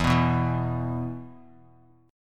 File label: E 5th, Bass Standard; strummed; JGuitar